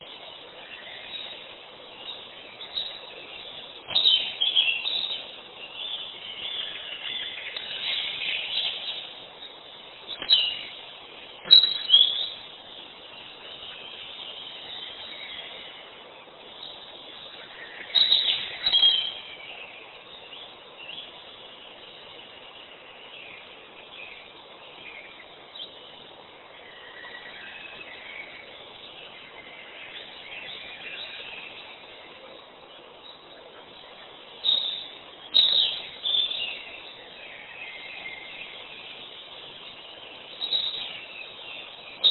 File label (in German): voegel; kunstradio